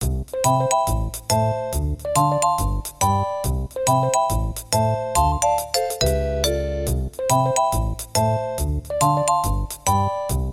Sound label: bgm